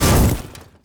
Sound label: impact glass metal